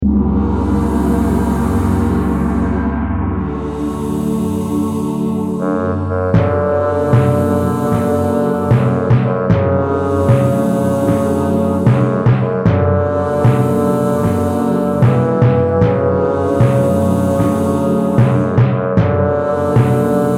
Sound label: epic defeat